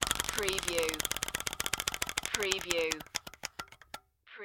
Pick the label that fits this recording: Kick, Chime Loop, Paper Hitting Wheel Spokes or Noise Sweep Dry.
Paper Hitting Wheel Spokes